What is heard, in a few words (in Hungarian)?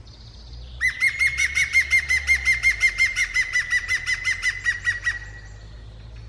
Zold kullo hang; martonvasar